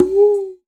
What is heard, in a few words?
conga wind; Cuba; AKAI S6000 CD-ROM - Volume 5